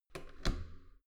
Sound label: metal door heavy handle down soft